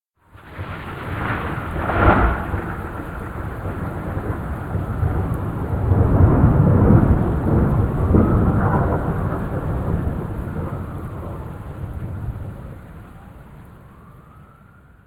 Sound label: Thunder; SyrinScape